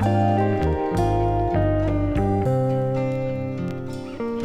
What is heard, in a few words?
guitar, Sad; Loops